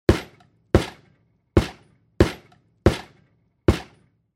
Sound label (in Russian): derevjannyj po setke